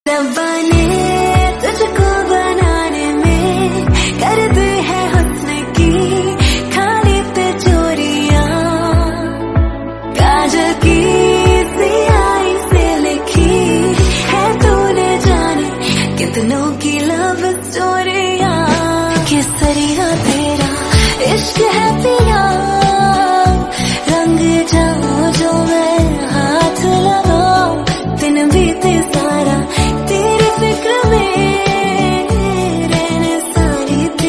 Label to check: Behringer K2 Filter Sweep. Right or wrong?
Wrong